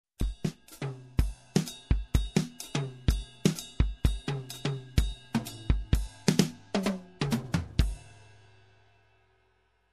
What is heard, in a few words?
Jazzy Drum Pattern